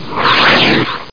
F-18 Hornet Exclamation

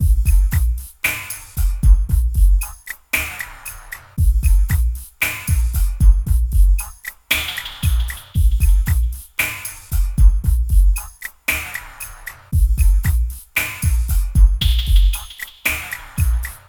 drum; 115 BPM

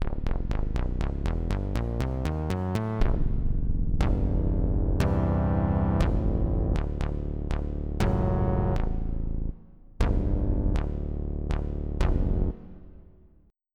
Electronica Kit; Onj's Soundfont

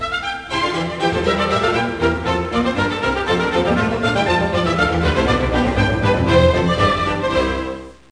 bourree

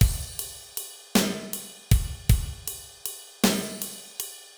Basic Beat Ride Crash; Pro Tools Blues

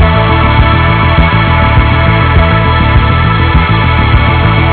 ahh; Tetris Max 2.2; Alternate Music

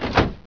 switching, close drawer; Widget Workshop; A Mad Scientist's Laboratory (1996); Maxis; Multiplexer

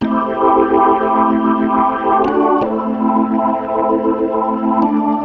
Synt; FUNKIN&GROOVIN'

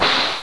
GUN; Death from Above

